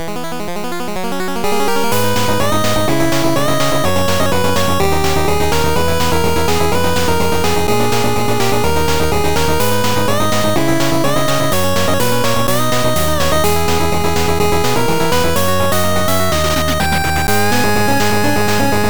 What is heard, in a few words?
8bit